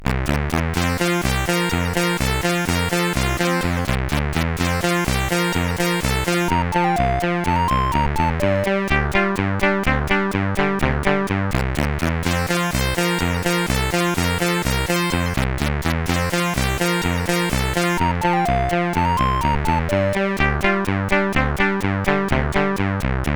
Clik Clak, CSG8580R5

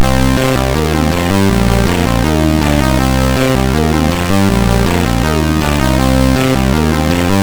sid MOS6581R2

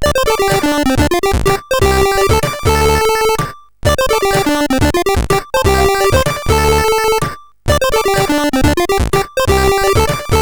ChipMotif sid CSG8580R5

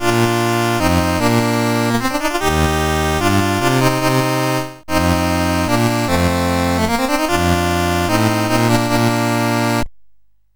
menus, sid CSG8580R5; Games Summer Edition

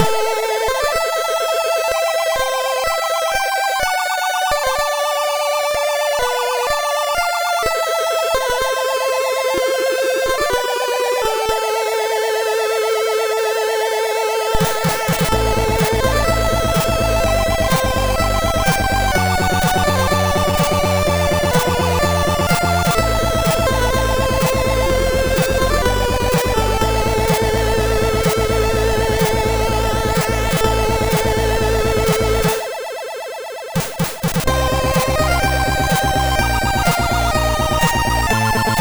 MOS6581R3

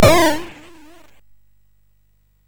SFX, MOS6581; Narco Police

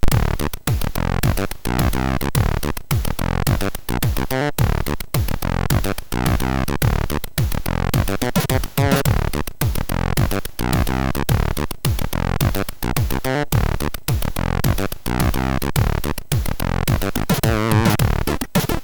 sid MOS6581R3; Fungus

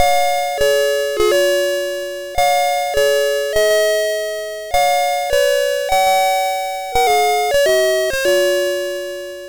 sid MOS6581R3, GAMES; Alice In Wonderland

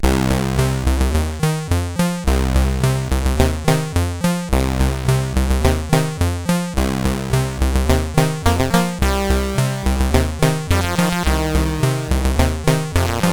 tape version CSG8580R5; Tai-Pan

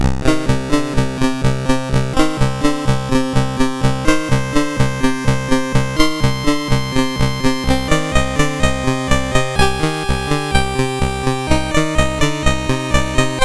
En Equipo, sid MOS6581R4

